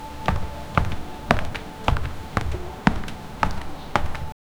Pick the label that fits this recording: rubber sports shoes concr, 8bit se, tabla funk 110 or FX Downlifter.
rubber sports shoes concr